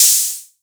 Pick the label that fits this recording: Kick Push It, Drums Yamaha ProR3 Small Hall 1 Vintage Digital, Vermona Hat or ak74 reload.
Vermona Hat